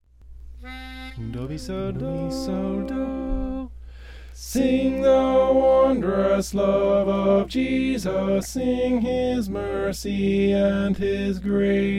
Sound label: sing the wondrous love tenor